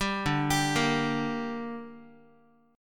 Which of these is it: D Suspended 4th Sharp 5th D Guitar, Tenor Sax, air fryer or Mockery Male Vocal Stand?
D Suspended 4th Sharp 5th D Guitar